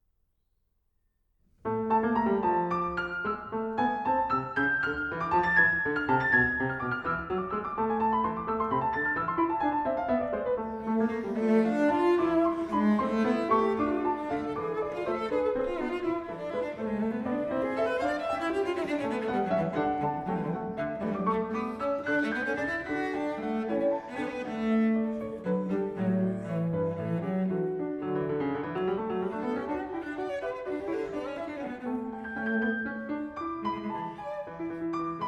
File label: cello piano; ronde